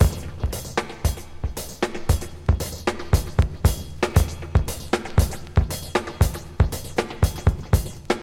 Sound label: 117 bpm high quality drum loop e key, kick tuned to the E note